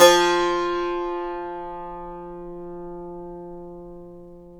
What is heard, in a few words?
SAZ; Roland - LA Composer vol. 3